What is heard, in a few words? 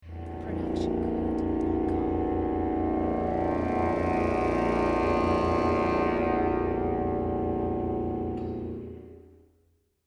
low ww cluster cresc dim